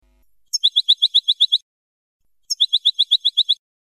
padreos corridos; campero

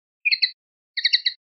toques de tateo; pardillo; rep adornos

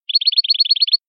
campanilleo

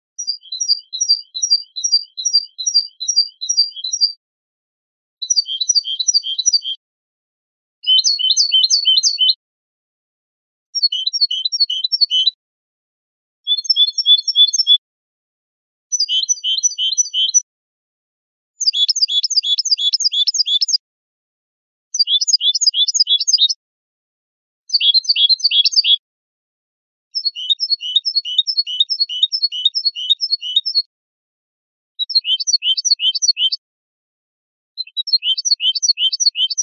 mecida

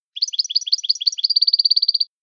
acanariado

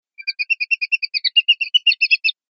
bailoteos, pardillo

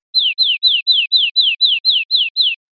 golpes corridos tio, verderon

